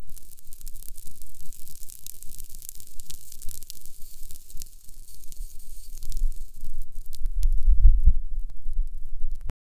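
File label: tiny dust particles jumping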